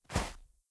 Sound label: Snow Foot Step; blue archive